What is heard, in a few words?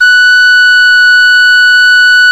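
PENNY WHISTLE, Exotic Winds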